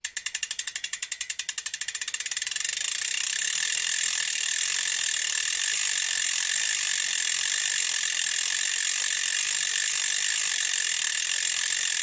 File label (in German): freilauf tune specialized schnell; Älterer Tune Freilauf